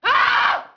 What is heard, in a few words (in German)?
Schrei kurz